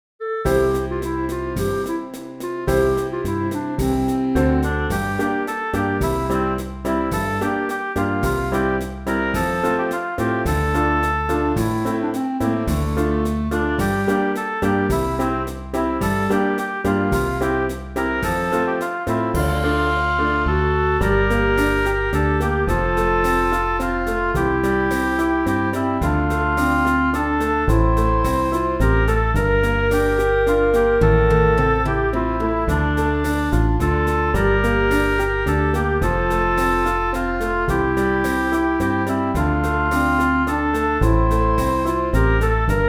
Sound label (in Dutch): Niemand mag het weten melodie